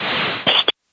noise to click; Ze Franks Pain Pack

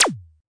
Visco Space Drum; DRUM MACHINES

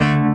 orchestra string hit D

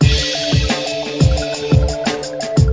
ambitechno; WORLD

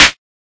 melbourne bounce Clap; STYLE KITS (Hip Hop, Etc); D3ron Melbourne Bounce Kit

